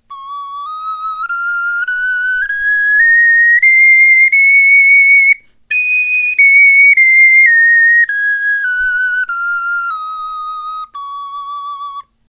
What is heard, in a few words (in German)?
tonleiter